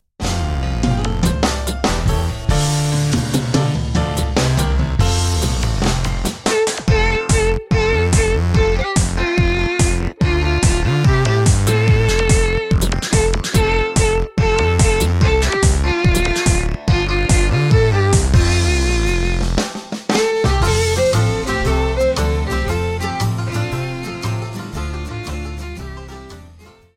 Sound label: Instrumental; It's Me, O Lord (Standing in the Need of Prayer)